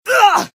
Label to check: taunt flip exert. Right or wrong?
Right